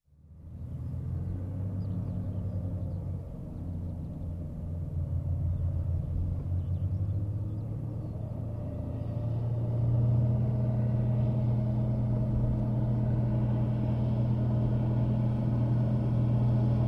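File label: tractor approaches from afar and passes by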